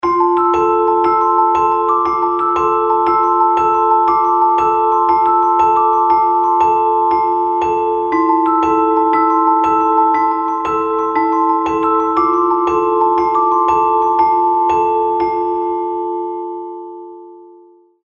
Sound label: Thoughtful Alarm, Celesta; HTC